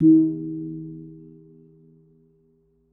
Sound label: Kempul, Gamelan